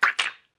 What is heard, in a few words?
slosh; Lewd SFX